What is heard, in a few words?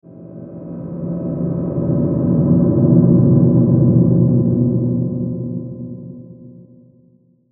Swell Metallic Ring Low; Metallic Sound; Blastwave